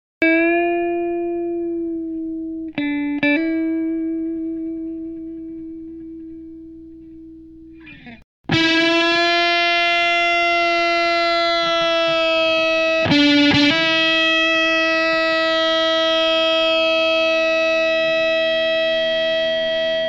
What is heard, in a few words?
flender w- dearmond2k pups; ronsound